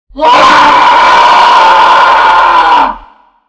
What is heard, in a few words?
chase